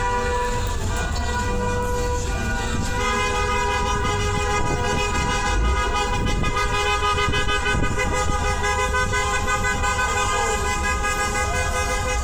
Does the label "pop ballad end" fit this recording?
No